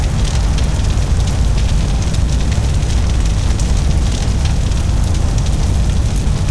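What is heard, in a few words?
cauldron loop main; Garry's Mod; tf2; cp harbor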